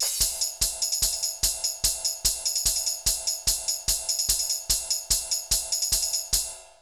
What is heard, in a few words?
Essential beats; FM 57 - The Ultimate Sample Collection (1997); Higher Sauce 1,2,3(147)